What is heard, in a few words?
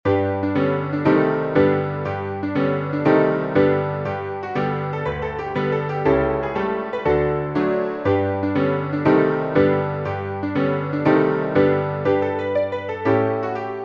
PIANO